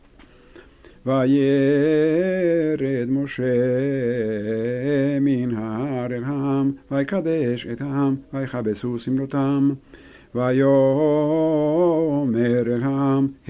Parasha repeated, Shavuot

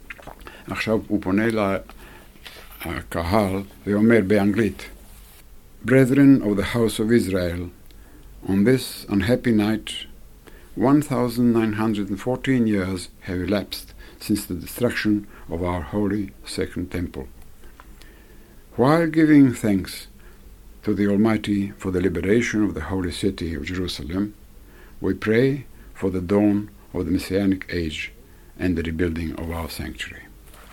Ahenu bet Yisrael english only

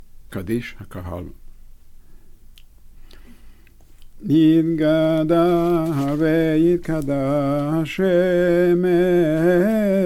Kaddish yehe shelama congregation; RH Arbit

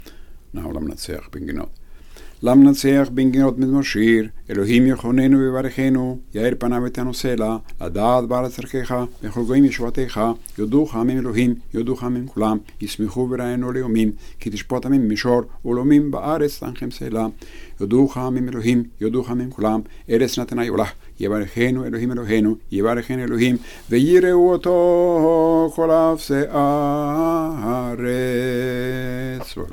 Lamnatseah binginot

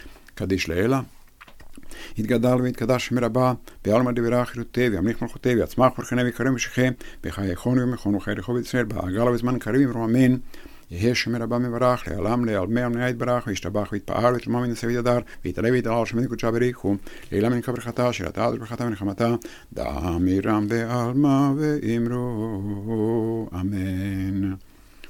Half kaddish; RH Minha

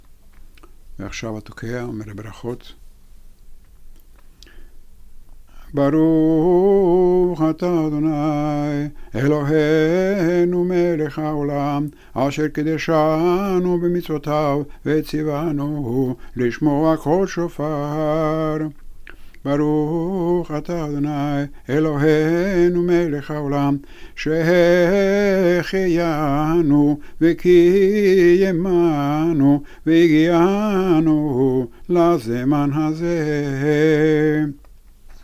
Berakhot shofar; RH Shaharit

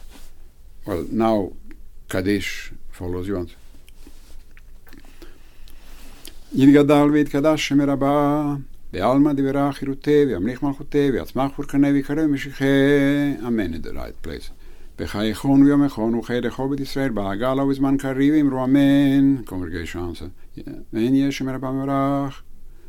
Half kaddish

